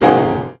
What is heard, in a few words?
piano hit